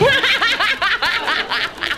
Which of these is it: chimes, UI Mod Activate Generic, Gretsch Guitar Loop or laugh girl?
laugh girl